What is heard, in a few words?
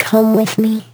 Vocal Phrases; Vengeance Samples; Vocals